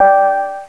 Get Item; Mythos

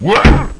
slam yeow

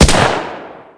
m1 garand fire, weapons; Spearhead Demo

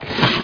slider; Microsoft Graphics Studio Greetings; Microsoft 1998

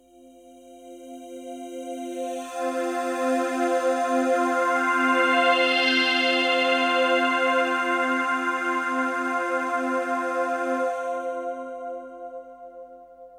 pad

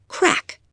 CRACK

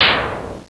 striking; Sound Effects for Multimedia - Volume 1

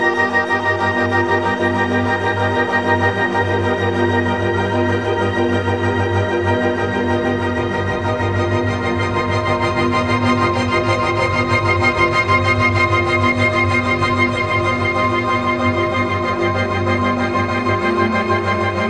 musc

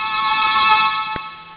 warm string; The Epic Collection 3; Epic Marketing; muzak samples

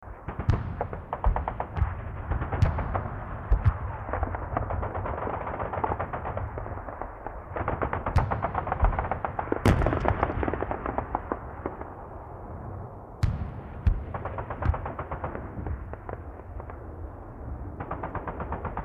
Battle Background; 1944 Battle Of The Bulge

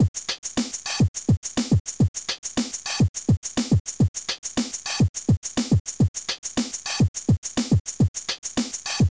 hiphop, 105 bpm; octabeats; OctaMED Module; Mecomp Multimedia 1

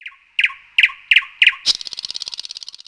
Birds Error; Nature

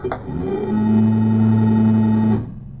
open; The Dream of Mars

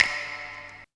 PERCUSSN DISCO 125; Drum

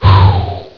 magic; Ferazel's Wand Demo

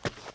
SFT STEP; ageofwonder; Moves

